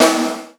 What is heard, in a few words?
GATE SD; USB Soundscan vol.10; AKAI; 90s sample CD; GATEKIT 1